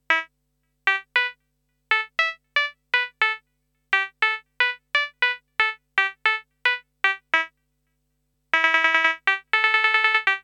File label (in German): Teisco S100P BANJO mit Repeat